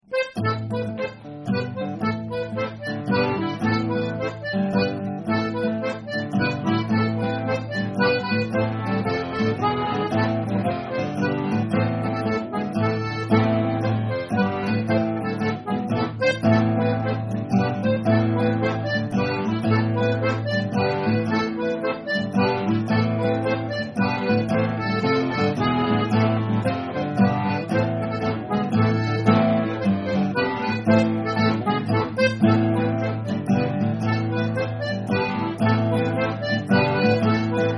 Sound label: Instrumental; Le Jau Martin